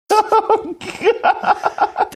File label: laughing oh god